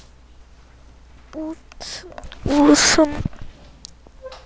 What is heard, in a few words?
laugh